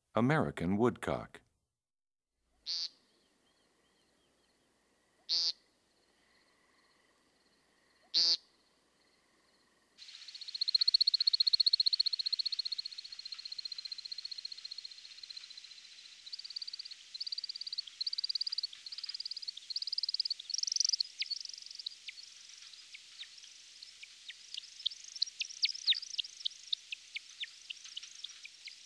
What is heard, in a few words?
American Woodcock, Wilson's Snipe, Short-billed Dowitcher, Long-billed Dowitcher, Whimbrel; Willet; Greater Yellowlegs; Lesser Yellowlegs; Bird Songs Eastern-Central